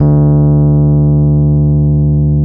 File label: D 1, Electric Pianos; Wizoo